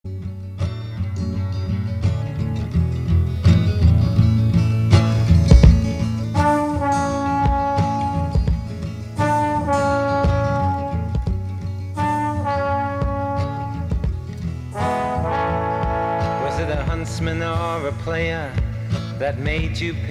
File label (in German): Musik; Attac; LoRa